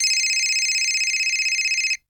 Cell Phone Ring; Communications; 1001 Sound Effects

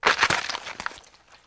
Limb and Flesh rip and chewing; RadwinIsland

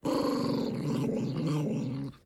growl; Apotheorasis

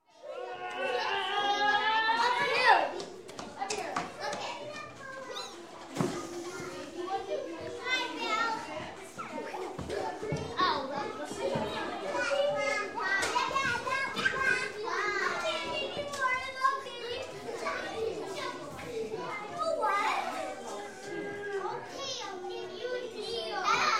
Children Nursery School - Running Around, Talki; Running Around, Talking; Sound Ideas 2000 Series

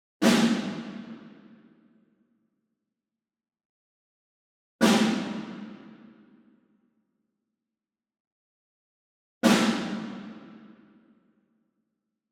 ENSEMBLE SNARE Group Hit Far; boom library; Cinematic Strikes Construction Kit

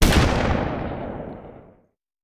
Rifle; Sound Ideas; Guns

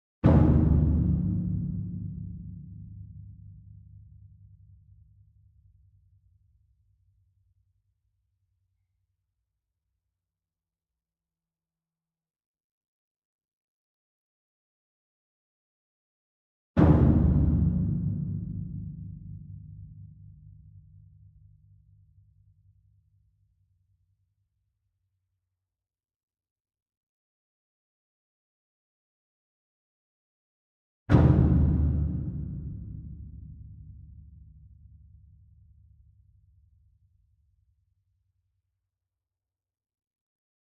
ENSEMBLE DRUM Bass Drum Concert Large Hit Far; boom library; Cinematic Strikes Construction Kit

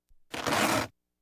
Household Phones

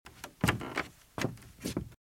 Footsteps - Wood - Creaky - Shuffle; RadwinIsland

